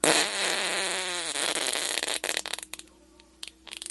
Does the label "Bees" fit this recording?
No